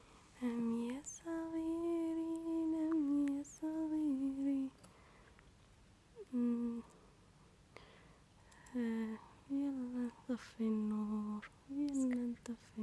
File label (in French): berceuse